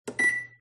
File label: oven timer; fox audio